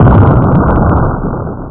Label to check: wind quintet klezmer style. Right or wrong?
Wrong